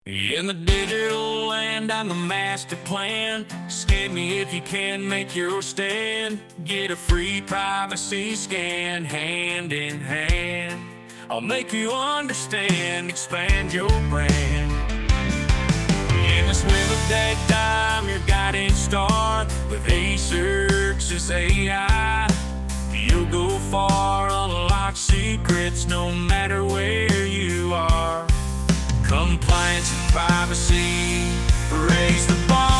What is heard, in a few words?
country